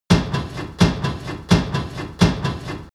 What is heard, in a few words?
industry machine mechanic metal fast